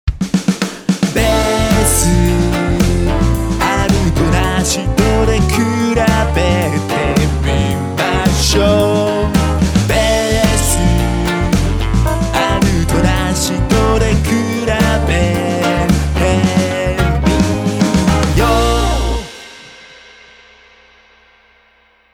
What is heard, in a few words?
bass